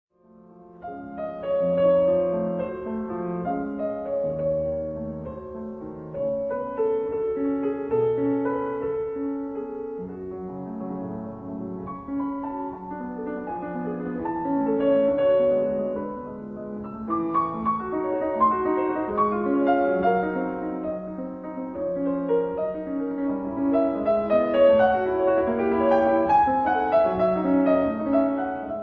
Sonata for violin & piano in A major Allegro appassionato